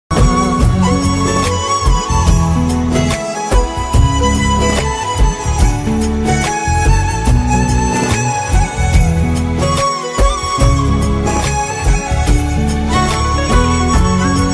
Flute Music

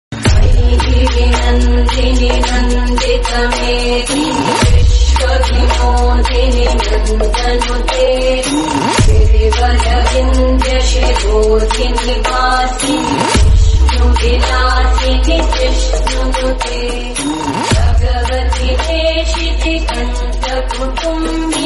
Telugu

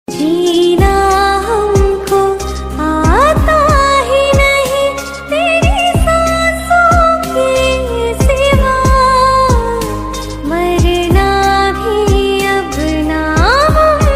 Female Version Ringtone

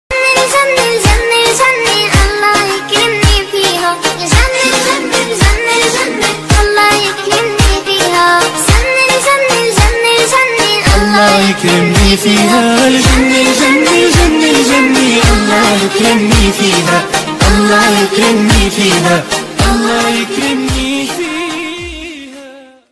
Arabic Song Ringtone; ringtones

